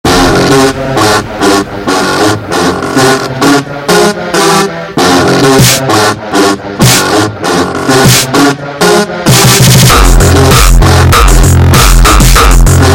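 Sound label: Tuba Hardtekk sped Up, funk remix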